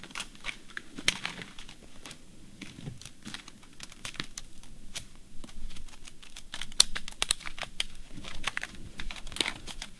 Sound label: book; Шелест бумаги